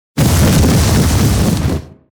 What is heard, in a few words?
Fire element spell